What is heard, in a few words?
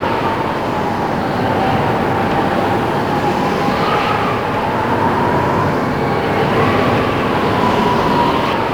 WIND; USB Soundscan vol.03 - Pure Electro; AKAI; AMB FX